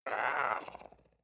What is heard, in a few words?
Pet Speak; Catbrow; Pet Ship